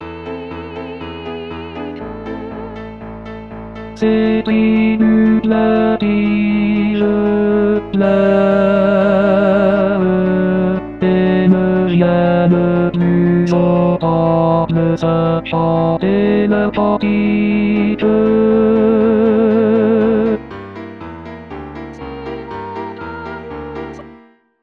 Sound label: cantilene tenor; en français; Gallia Lamentations (Jérusalem )